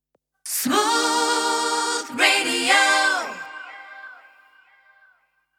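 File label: Sung Jingles, News, C minor 122bpm, Acapella; Smooth 105.6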